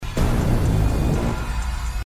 fart

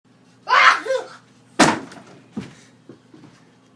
kid hits wall

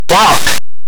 walk; walk (playroom)

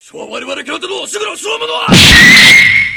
anime punch; subaluwa

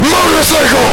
motorcycle

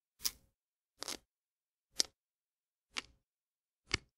stick breaking

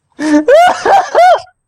wuahaha happy; bert

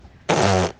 brrrrr

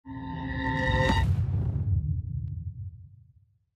game over sound; the last of us

